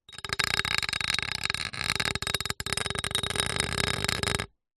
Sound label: household garden trowel scrape on concrete